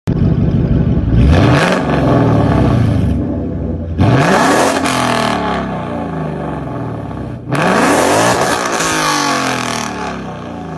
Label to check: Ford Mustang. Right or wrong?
Right